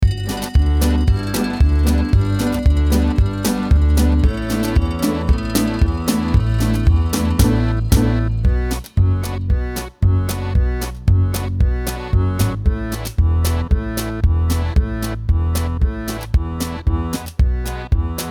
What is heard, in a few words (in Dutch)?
instrumentaal